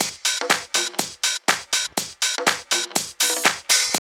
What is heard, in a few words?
house loop